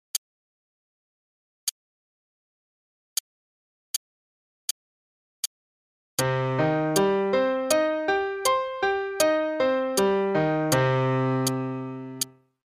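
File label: Arpeggios (c minor) (quarter note=80), 2 octaves; CM Level 5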